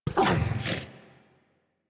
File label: Tame Interact Stand Scratch Bark; Enemies; Warframe